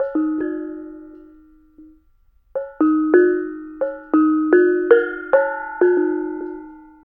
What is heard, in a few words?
BONANG; Spectrasonics; Heart of Asia; BONANG PH